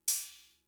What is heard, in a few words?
Cymbal; Fricke MFB-522